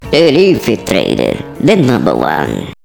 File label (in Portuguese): vinheta; sheriff